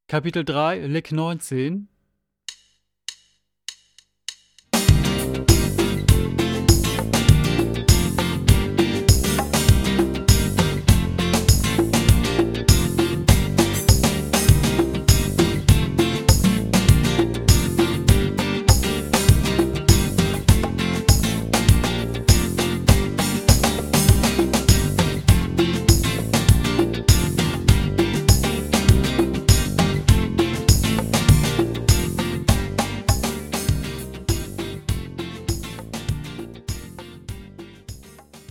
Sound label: Latin Style Guitar